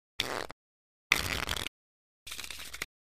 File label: Cards Shuffle Deck; casino games